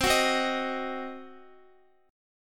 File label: C Diminished C, Guitar 4F,4A,5C,5G,5C,6E; strummed; JGuitar